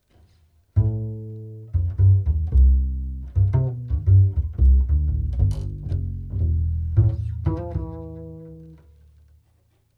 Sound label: cool bassline